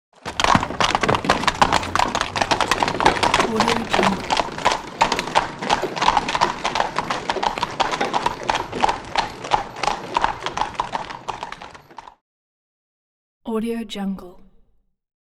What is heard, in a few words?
horse and carriage moves along on hard road; hollywoodedge